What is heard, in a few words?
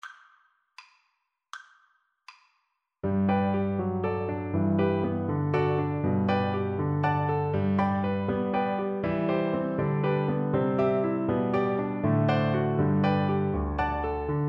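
lieder VLC karaoke, Cello; brahms; 5 lieder o liebliche wangen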